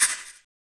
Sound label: RSS PERC